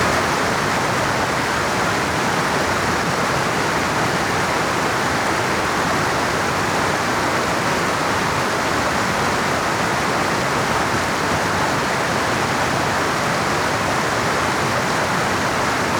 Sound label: Waterfall